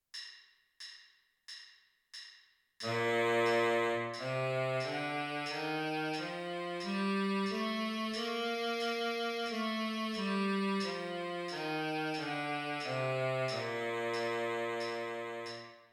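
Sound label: g major scale baritone sax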